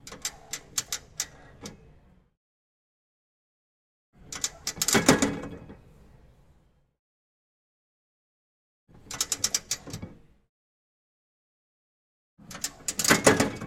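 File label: sounds of turnstile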